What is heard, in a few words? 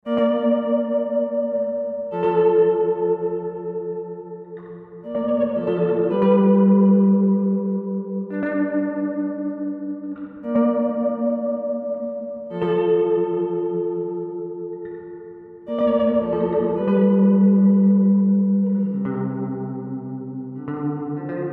Seymour Duncan Dark Sun